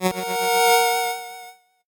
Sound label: 8bit se